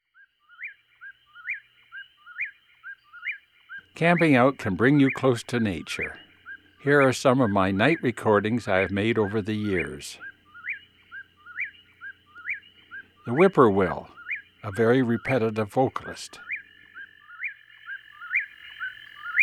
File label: Whip-Poor-Will; Eastern North America; Dan Gibson; Wildlife Identification By Sound